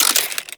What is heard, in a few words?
Laser Aim Rifle Semi Switch; Warframe; Grineer